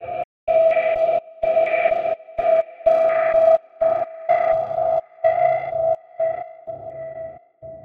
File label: Cinematic Metal Impacts; Attractive Arachnid